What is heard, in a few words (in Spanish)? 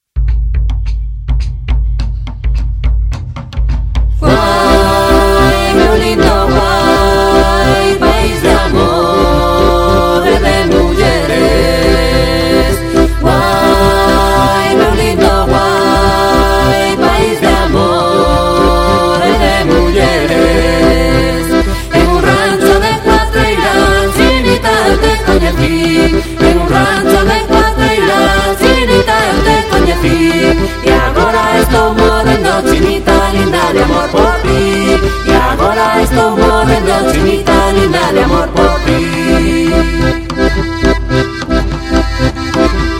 rumba de cuba